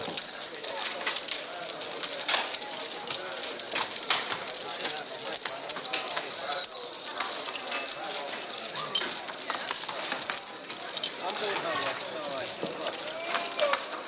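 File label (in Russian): фон игровой комнаты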